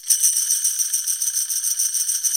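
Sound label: tambourine rattle; Zero-G Ambient 1; PERCUSSION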